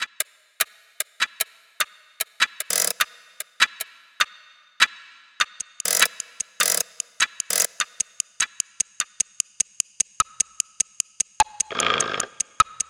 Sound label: Clock Ambience; Spyro Attack of the Rhynocs; gba